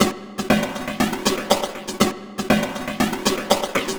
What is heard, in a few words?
Maqsuum Beat; Maqsuum Transgene Beat; Sonic Foundry (Sony Creative Software); Loop Noir